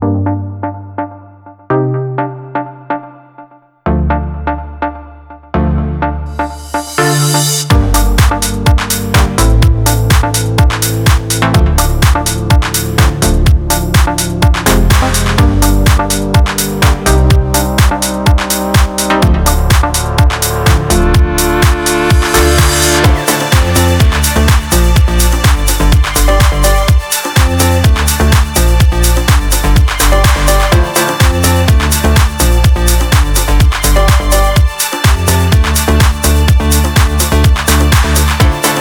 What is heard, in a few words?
Kicker EDM